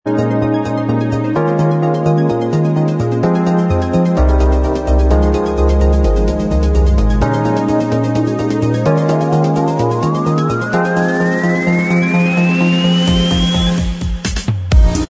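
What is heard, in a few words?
instrumental, backing track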